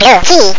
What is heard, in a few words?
blue key; bkiller